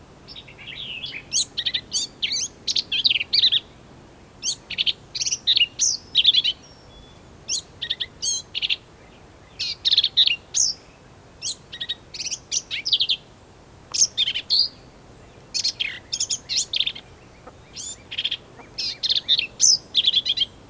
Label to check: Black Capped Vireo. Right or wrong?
Right